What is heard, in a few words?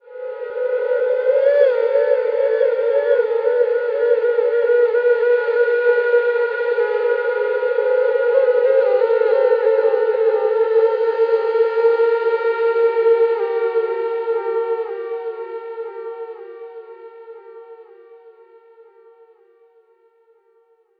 PROCESSED OCARENA, LIVE ACOUSTIC PERCUSSION; Sonic Foundry (Sony Creative Software); Vir Unis Infusion